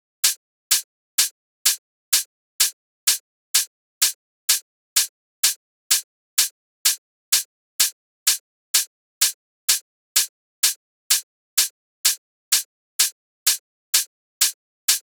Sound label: Drum Loop 127 BPM Open Hat, House; Loop Stems; Cymatics; GHOST (Beta Pack); All There Drum Loop